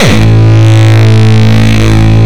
Longkick, kicks

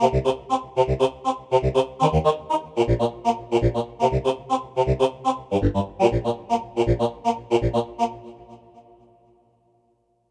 Choir Bounce; Dr. Fink's Funk Factory

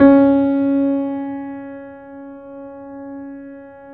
PED MF, GRAND PED

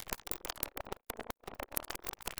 loop, techno atmos

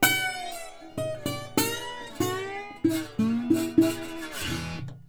Blues Guitar Samples; ILIO - Fretworks

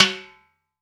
timbales; AKAI S-Series CD-ROM Sound Library VOL-5

